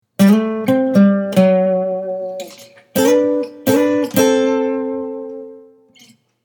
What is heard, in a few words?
minor major combi lick